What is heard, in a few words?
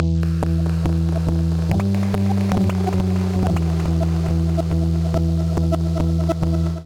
Subs Poppers E Minor 140 bpm; MIDI Clips; Tonal; Percussion & FX; Skitter and Step; Ableton Factory Packs